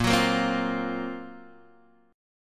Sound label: F sharp Major Sharp 11th A sharp